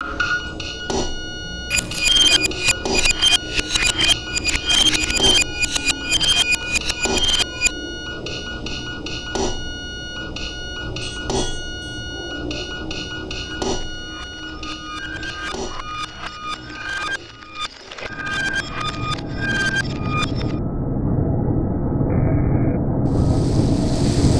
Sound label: thunderstorm